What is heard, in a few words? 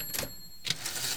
ping